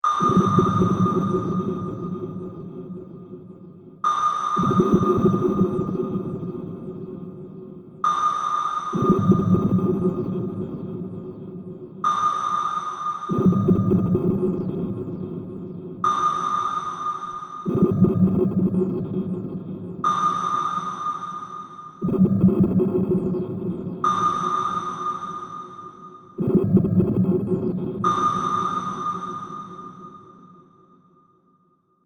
Sonar, Repeating looping Submarine sonar frequency effect with atmospheric underwater sounds.; Tech